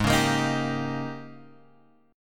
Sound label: G sharp Major 7th Suspended 2nd Suspended 4th; G#M7sus2sus4 Chord {4 4 X 3 4 3} strummed